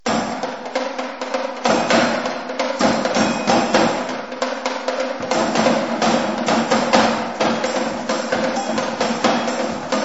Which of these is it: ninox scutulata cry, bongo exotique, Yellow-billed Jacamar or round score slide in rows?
bongo exotique